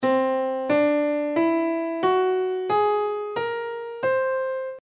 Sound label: whole tone scale